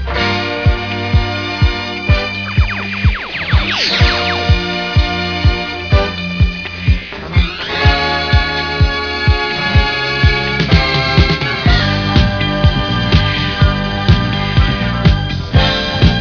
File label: Disco